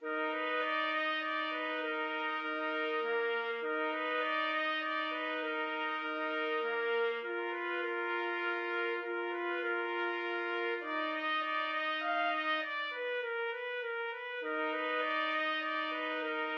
charming snake trumpet duet

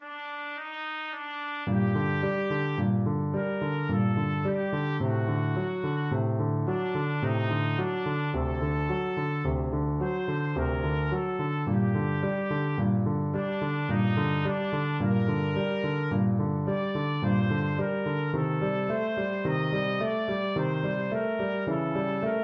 mozart k364 II trumpet

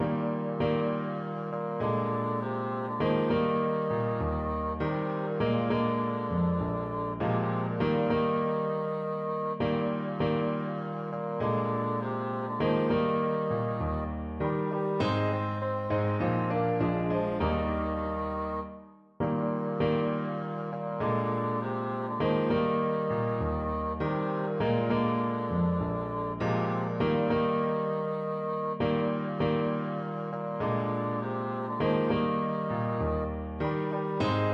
mocking bird, bassoon

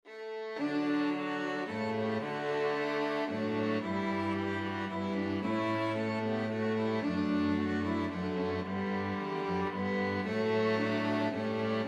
string quartet; ashgrove

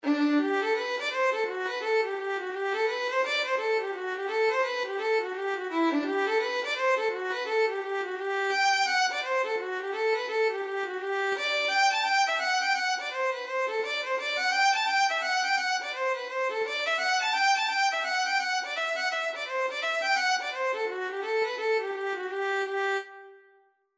violin; annie oneill

